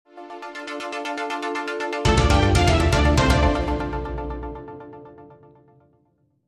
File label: Commercial Break Segue; Sting